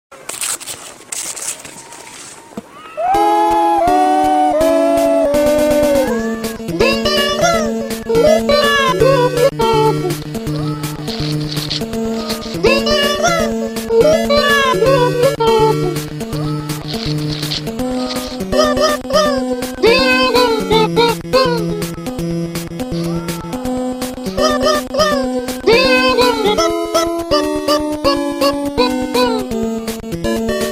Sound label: seal